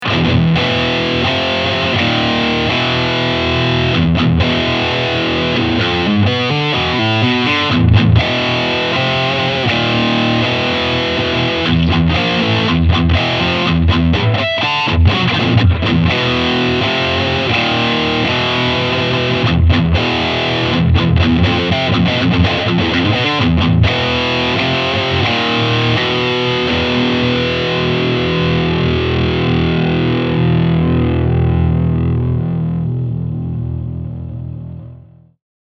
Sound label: Blackstar HT 20R MkII Modern Overdrive